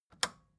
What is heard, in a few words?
record player power push on